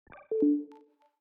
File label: ui sound